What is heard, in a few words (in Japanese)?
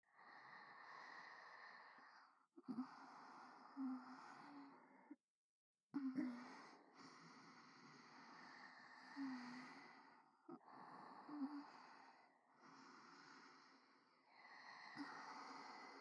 双子の寝息ループ; ダウナー系仲居のゆまちゃんに温泉旅行中に色エロされちゃうバイノーラルwith わかな＆わかば